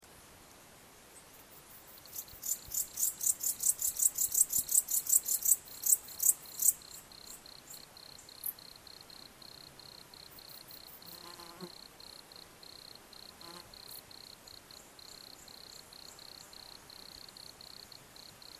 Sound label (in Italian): grilli in faggeta; Ascoltando il bosco